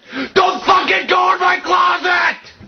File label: YELLING